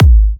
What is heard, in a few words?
G#, kicks; markus hakala kicks